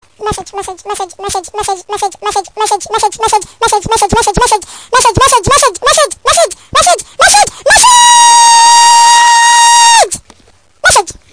funny message message tone ringtone, sms ringtones